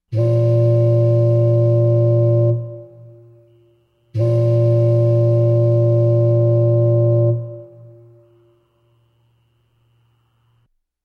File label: Ship Horn 2 Blasts